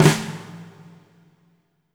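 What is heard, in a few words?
fat snare right; Spectrasonics; Burning Grooves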